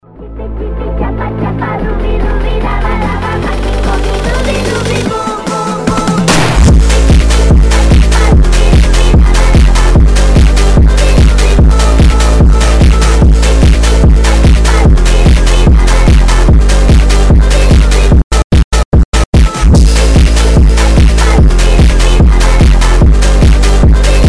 phonk extended

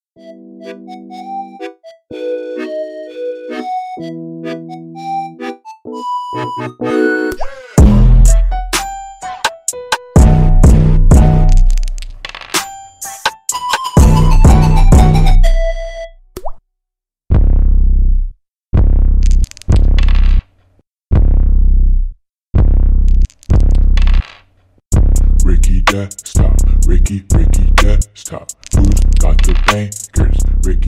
beat

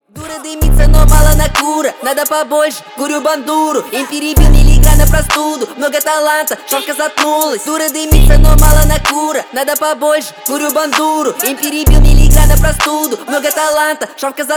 bandura; хип хоп

